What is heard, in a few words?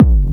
BD Clubby, kicks; VEC1 Clubby